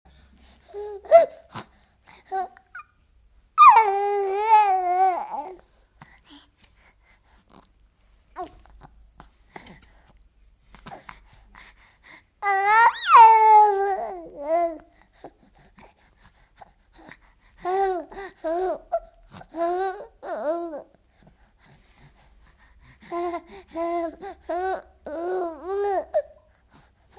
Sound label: Baby